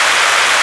sea; sound canvas

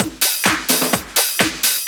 Latin Loop 128 BPM

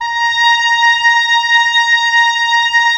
WND OB F, Oboe f; Roland - LA Composer vol. 4

